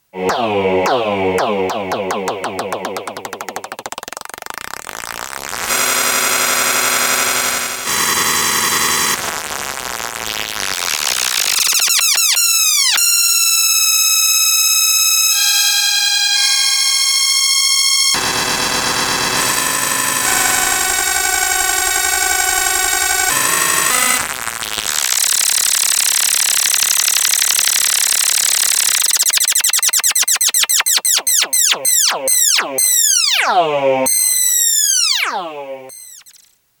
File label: OASYS LFOs